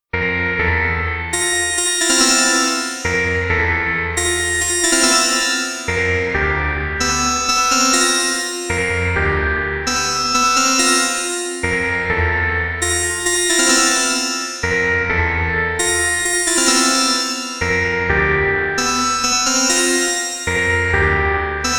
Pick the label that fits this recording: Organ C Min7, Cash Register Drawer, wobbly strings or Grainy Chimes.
Grainy Chimes